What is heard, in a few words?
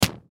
Rubber Band Shoot, Rubber Band Shoot Fling; Fling Snap Break; Miscellaneous Office; Offices